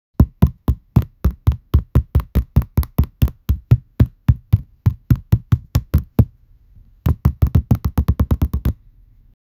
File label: quick rhythmic bounce, basketball